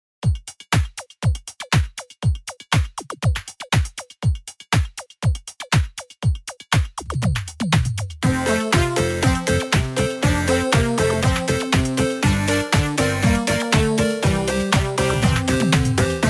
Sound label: mini-game (8bit version); Astral Chain; Lappy Mini-game